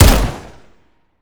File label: fire, weapons 870; Urban-Coalition; Decay; arccw ud